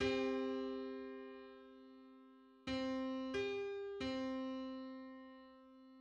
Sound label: 3125th harmonic on C